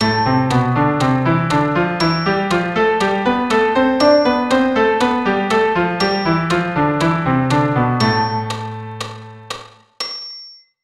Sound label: Am natural